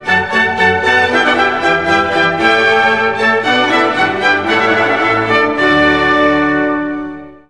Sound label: water music